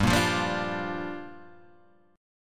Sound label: G Minor 7th